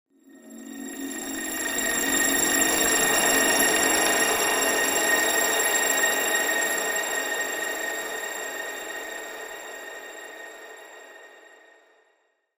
Horror Ambient Pad; Scary Soundscape Sound Effects

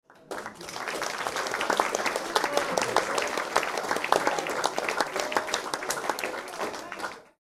Applause and low calls Small Interior Crowd; Applause Sound Effects

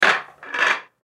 Pool Rack Slide; Pool (Sound Effects:Sports); Billiards

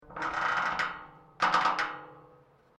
GATE METAL LARGE CREAK, Large metal gate; Gates (Sound Effects:Doors)

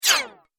Multimedia Rollover, mouseover sound

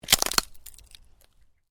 Ice: short crack of ice under pressure; Snow; Weather; Stuart Duffield

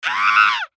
Human Scream; Scream Frightened Male Seven Yrs Boy; Blastwave

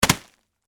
Impact Object Fall, On, Plastic, Tarp; Miscellaneous Impact; BlastWave

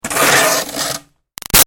Snow Scrape Shovel By Gritty

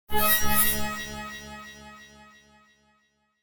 Attack Spell, magic sound effect; Merlin; Fantasy sound effect; role playing games, magic videogames

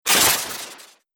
Laser Scratch, sci-fi laser sound effect; Laser Scratch Fx; Andrew Fleming

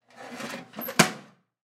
Chair Metal Folding, Chair Metal Folding Fold; Chairs; Household; Offices; Blastwave

